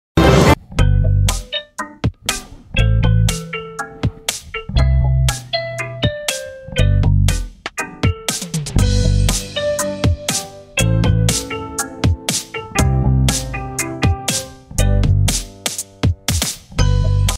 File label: violin ringtone instrumental ringtone